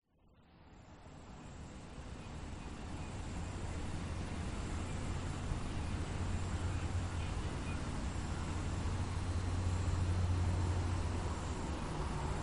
soundscape residence early morning several aircraft flying by; omar alvarado